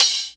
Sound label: HH, Dance Mega Drums; Best Service; HH-HIP